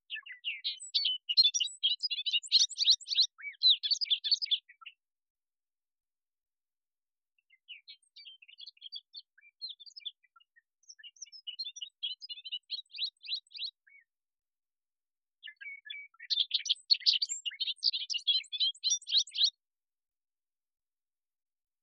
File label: Bobolink